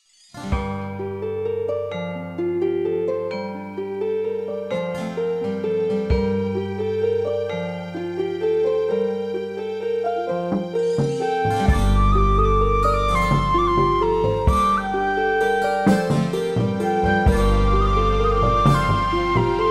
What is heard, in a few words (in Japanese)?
fue